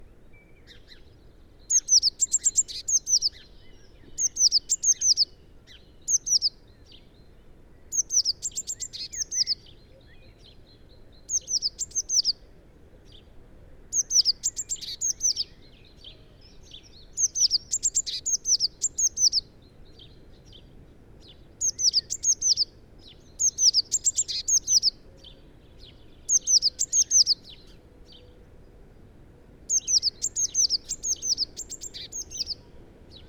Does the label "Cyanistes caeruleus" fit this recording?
Yes